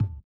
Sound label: tabla, Indiatek; Spectrasonics; Metamorphosis